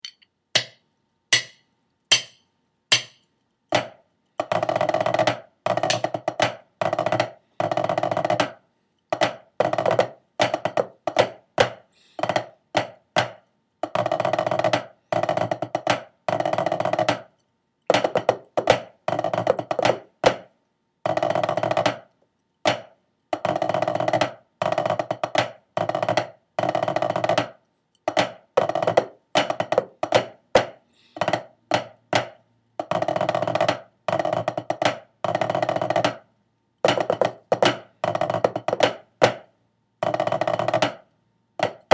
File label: snare tenor; colins cattle